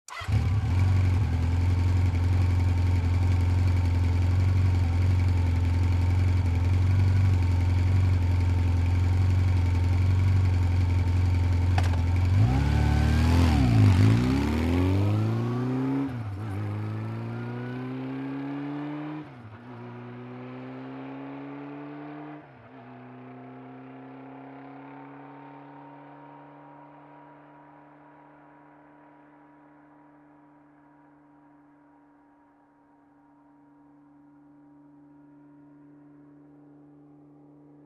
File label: Motorcycle starts, waits, drives quickly